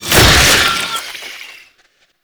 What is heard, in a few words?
Undead Owl Attack; Warframe; Dante